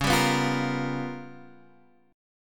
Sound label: G 7th Sharp 9th Flat 5th